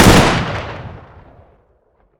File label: m3s90 fire